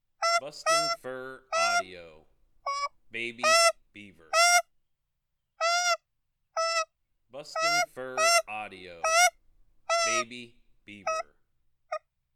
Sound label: Baby Beaver